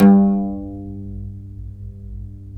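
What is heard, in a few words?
pluck OUD G; Roland - LA Composer vol. 3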